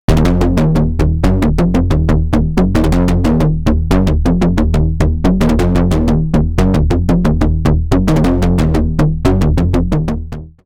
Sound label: Moog Mariana Detuned Perc Bass